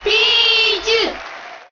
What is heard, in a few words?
Cheer Japanese; Pichu; SSBM